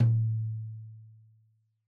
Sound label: Tom2 Stereo, Deeply Sampled Drums; SMDrum